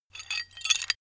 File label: metal handling pieces